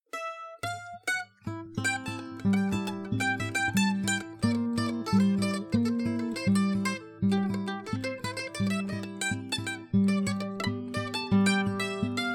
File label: blues